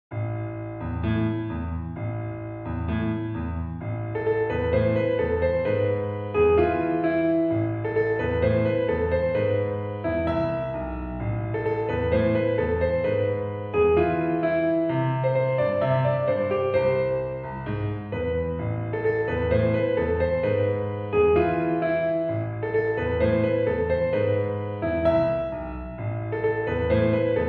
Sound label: Tango and Trio, piano